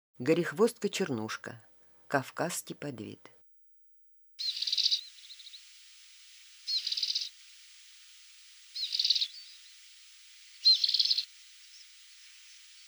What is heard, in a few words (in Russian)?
gorihvostka chernushka golos